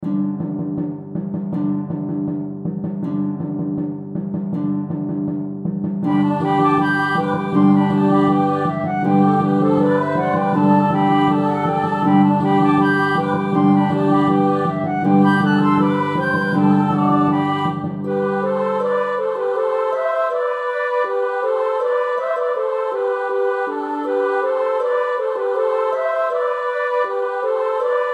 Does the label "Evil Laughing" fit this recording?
No